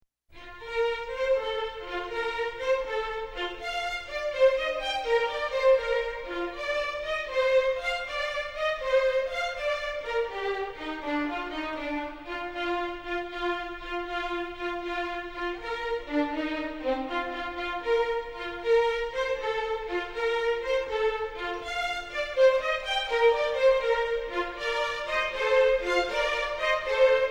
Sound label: Instrumental Round; Tuning A-Round